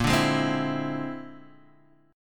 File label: A sharp 9th Flat 5th A sharp, Guitar, Open D